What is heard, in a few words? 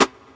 Natural Acoustic Snare Sound G Key, steel snare drum sound tuned to the G; snare drum samples